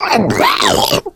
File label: female boomer alert; voice; Left 4 Dead 2